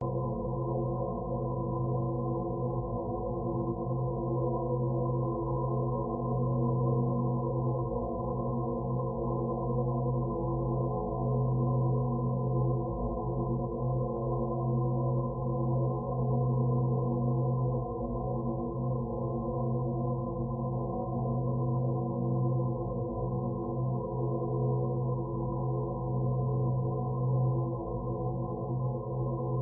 drone loop hum tritone vibrate spin slight sinister; Skyclad Sound